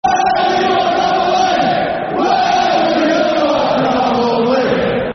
Where Were You [Football Chant (Soccer Song)], Sheffield; Sheffield Utd Sufc